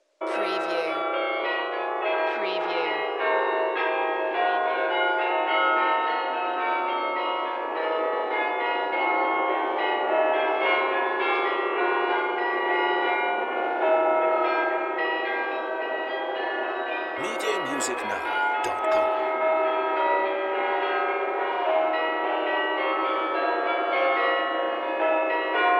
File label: Church Bells Carillon Light Traffic